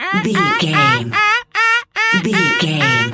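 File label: seagull squawk seq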